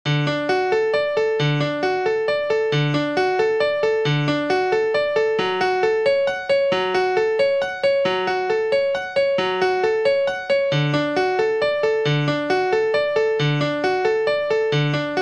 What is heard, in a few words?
Inspring Piano